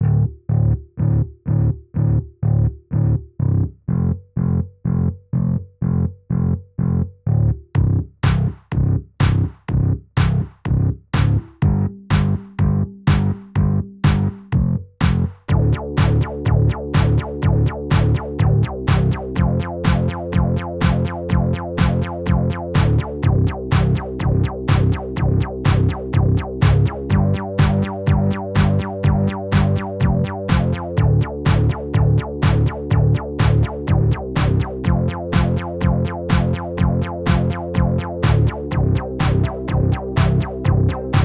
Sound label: Fireworks Beat